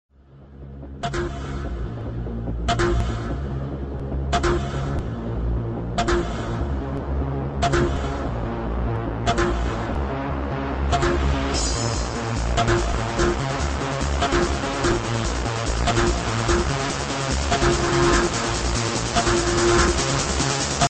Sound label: electro punk ringtone